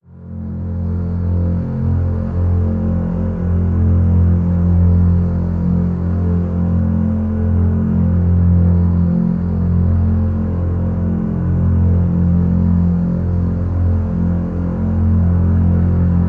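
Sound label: drone rosin F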